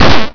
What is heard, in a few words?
beam